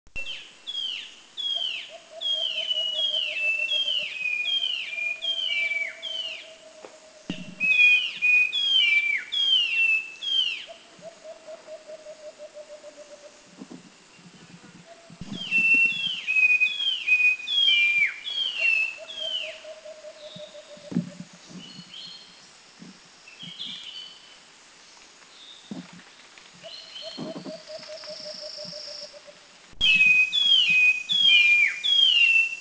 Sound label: Streaked Wren Babbler Bohol; Striated Wren-Babbler Ptilocichla mindanensis Country endemic; Rajah Sikatuna